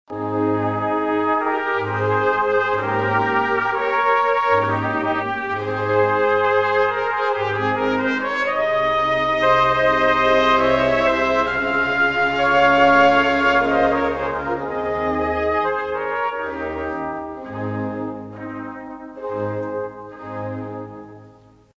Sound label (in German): Zweite Trompeten Stimme; TrompKonz